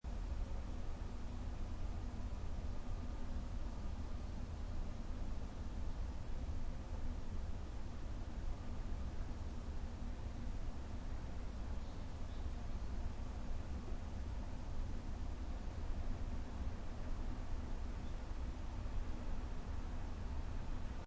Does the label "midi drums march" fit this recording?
No